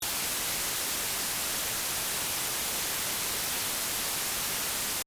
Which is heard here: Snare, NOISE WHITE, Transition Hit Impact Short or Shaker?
NOISE WHITE